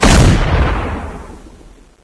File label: sniper fire, guns msg90; svencoop; custom monsters